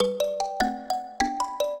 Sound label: trio, mbira; Mozart